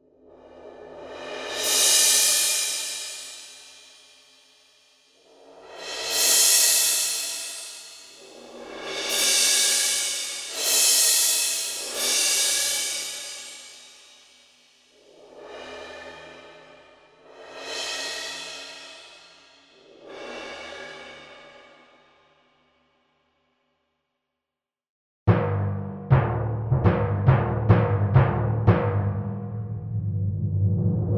Percussion

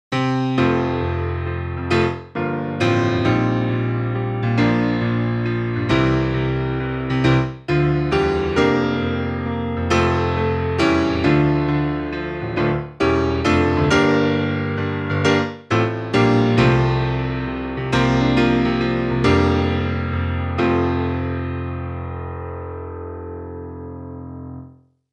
Bright

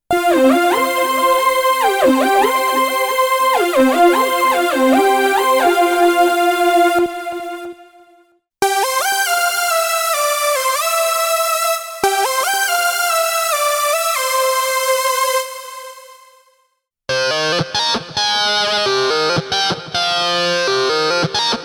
Live Worship Synth Leads

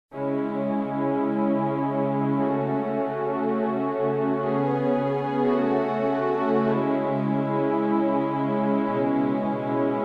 Vel String Pad

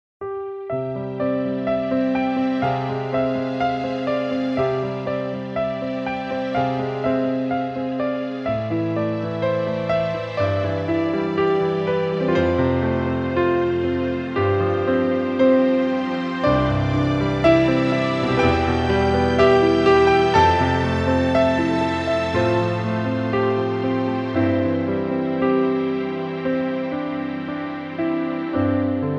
Piano Acoustic Strings

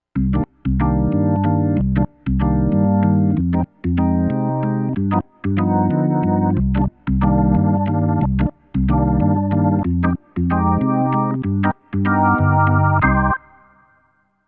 Jazz

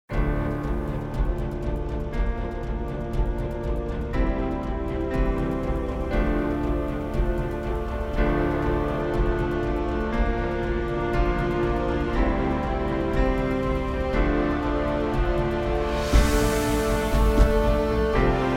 Montage Orch

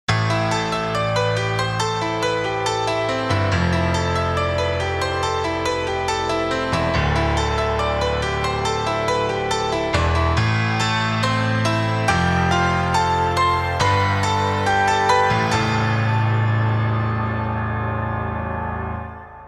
EG Montage Agressive Layers

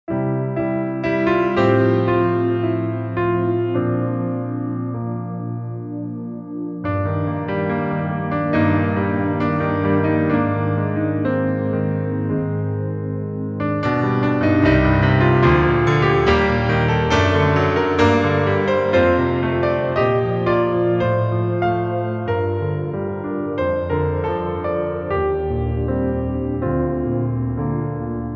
Pad Layer

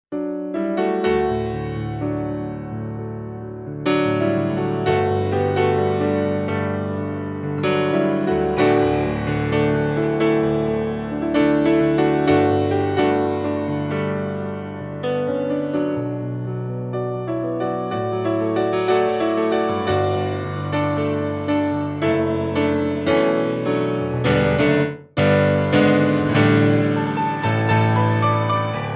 Natural Kawai

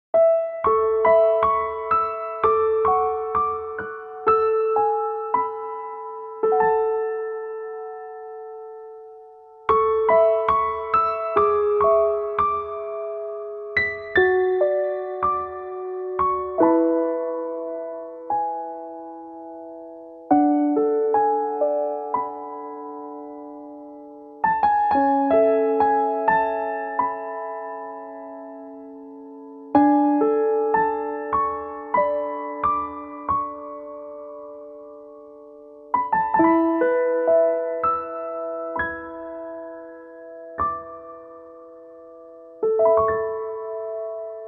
TV Drama

